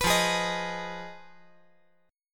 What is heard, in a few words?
F Minor 7th Flat 5th, chord; 16,17,16,18; down; 5G,4C,5E,5A; chord shape